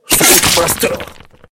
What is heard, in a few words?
power